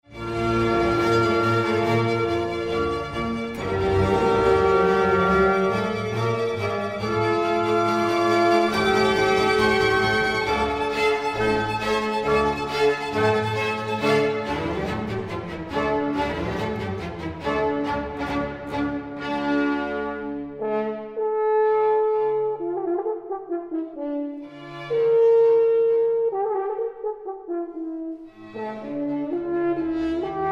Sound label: horn; Concerto a cor principal d-moll Murray C30; 1. Satz Allegro